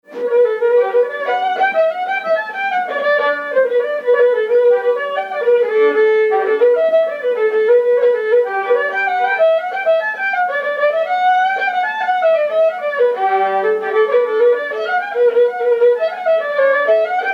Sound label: jig; Fasten the leg in her